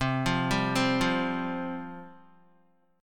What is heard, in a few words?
C Suspended 4th C, Guitar; arpeggiated